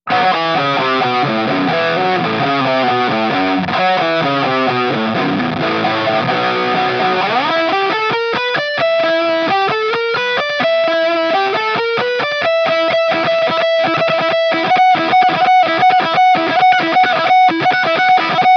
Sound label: gtr gain, guitar